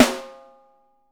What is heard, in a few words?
WOOD, Living Drums; Sampleheads; Peter Erskine Living Drums